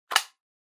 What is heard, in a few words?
Tabletop Timer Digital Battery Cover Close; タイマー機器